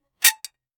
futuristic attention grab, attention

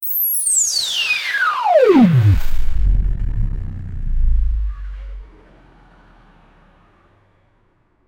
video transition

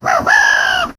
sound of a wild boar; game sounds

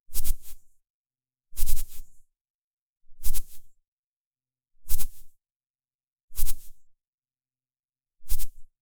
whisper like bamboo whisk

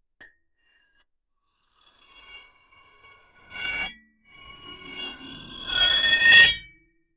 metal pole slide down, friction

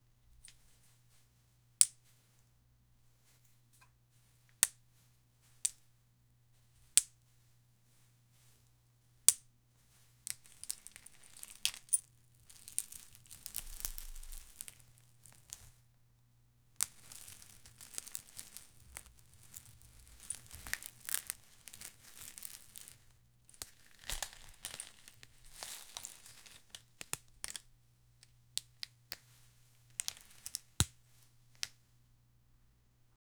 bamboo sticks tying

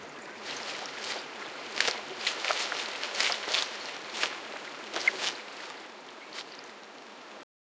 soft water swish forest; autumn

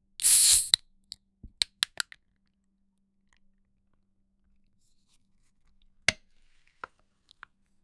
opening a soda can; beverage

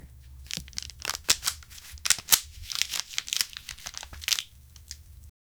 bandage application on a

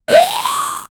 zombie death sound

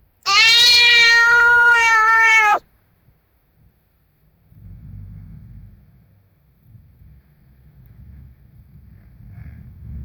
scream of march cat; agitated

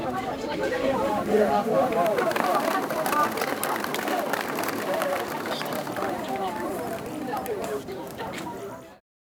medieval castle crowd

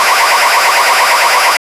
radio broadcasting laser

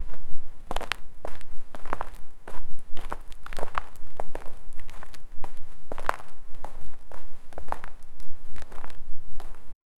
a thin footstep walking

